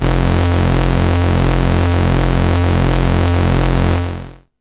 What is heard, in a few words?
bass F1; multisampled; must destroy you bass 3